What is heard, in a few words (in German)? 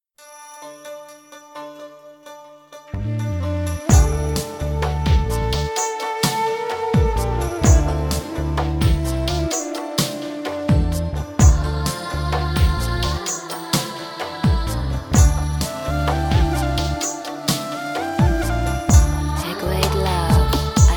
Indian Ballade